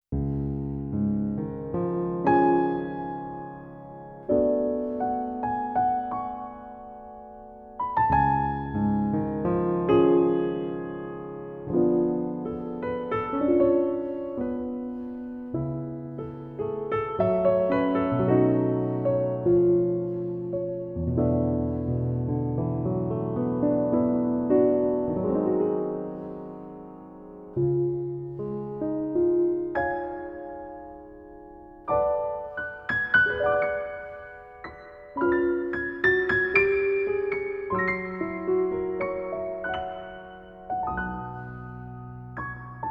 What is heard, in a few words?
Transparent piano; muv luv alternative total eclipse game soundtrack